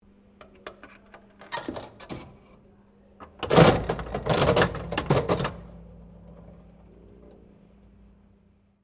SLOT MACHINE CLOCK SKILL TESTER OPERATE, Various old coin slot machines; Casino